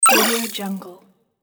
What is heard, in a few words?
retro game power up